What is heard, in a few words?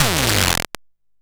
Crushed Perc, bitcrushed; musicradar